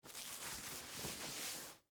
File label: Track Pants Adjust, Foley, Clothes, Track, Pants, Adjust, Movement; Dressing Sound Effects; SoundEffects-BlastWave